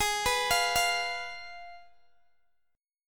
Ab Minor 6th Ab, Guitar Open G; down

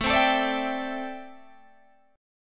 A Minor 11th A Guitar Open G

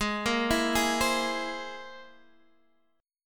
C Augmented 7th G sharp

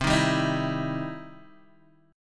E Minor Major 7th Sharp 5th C

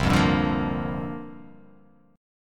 C 9th Suspended 4th C down

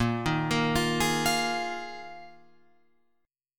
Bb 7th Suspended 4th Bb Guitar Open F; arpeggiated

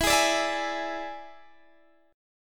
E 7th E down, E7 Chord; strummed

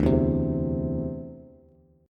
D 7th Suspended 2nd down Bass; D7sus2 strummed

